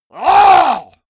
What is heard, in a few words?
monster growl attack throaty; schreie